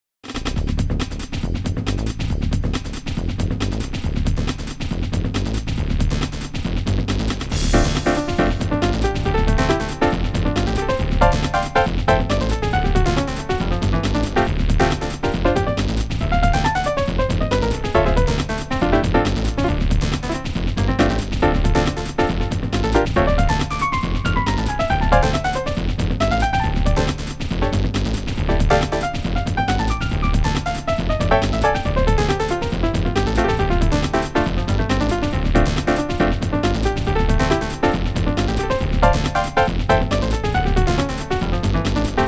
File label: acid jazz; coma favorites modland